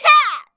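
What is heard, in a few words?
MON ATTACK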